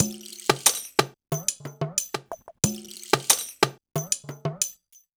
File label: Percussion, cinematic funk; Sonic Foundry (Sony Creative Software); Cinematic Funk Urban Tone Poems